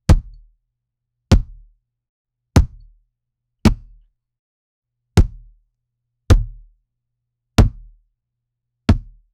ball kick and ball touch

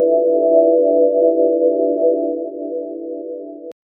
futuristic alien, arpeggio

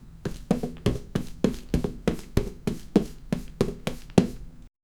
boy and dragon walking

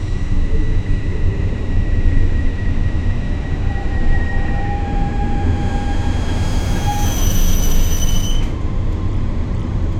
subway train in motion, air rush

